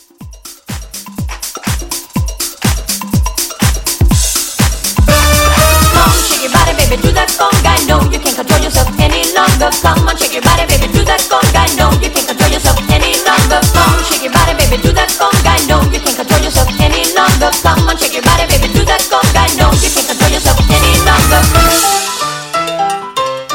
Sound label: House Mixshow Re Drum 123